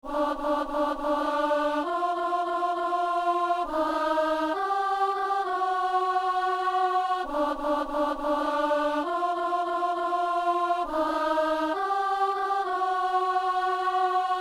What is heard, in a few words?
alto; Messe de San Lorenzo - Alleluia